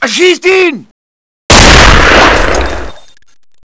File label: singleshot